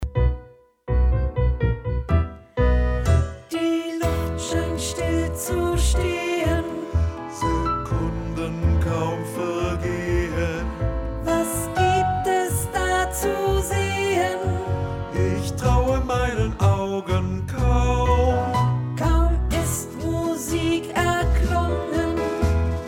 Tango-Tanz - Chor

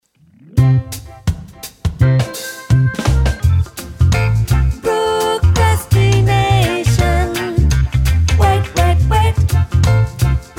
Sopran

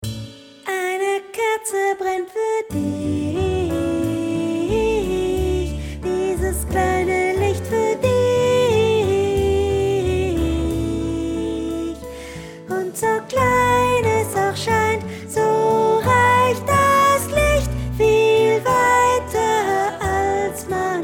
Sopran

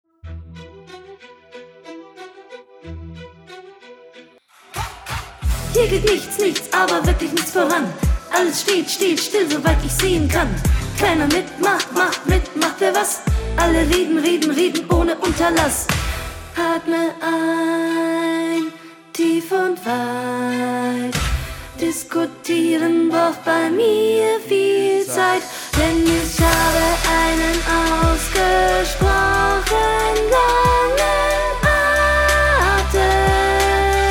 Sopran

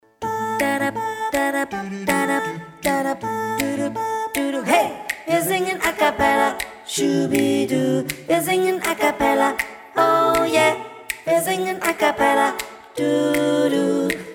Acappella - Alt

